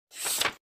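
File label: paper money combine with coins; barya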